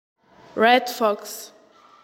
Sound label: Red fox